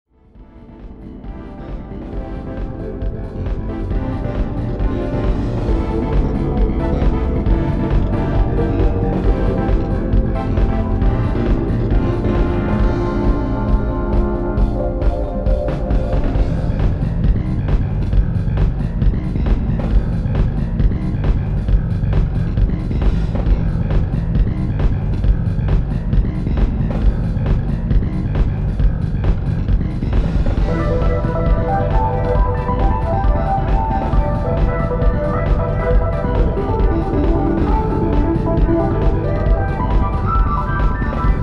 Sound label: BGM; pro evolution soccer 5; world soccer winning eleven 9; playstation portable psp